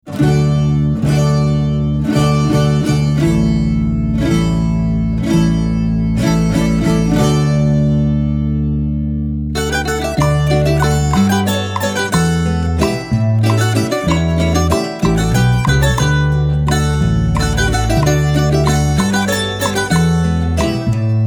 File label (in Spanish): Tonada cruzada a compás; Cubamallorca